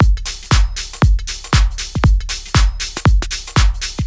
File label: Bouncy House Loop